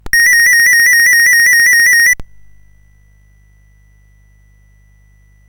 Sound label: sid CSG8580R5; Nine Princes in Amber